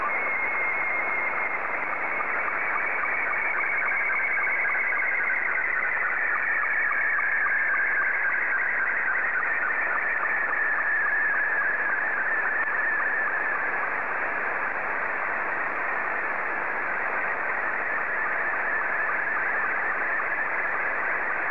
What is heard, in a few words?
sstv; VK8VTX